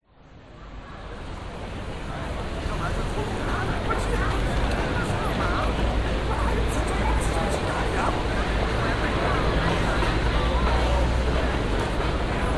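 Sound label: public places city street ambience pedestrians pass by and distant city hum street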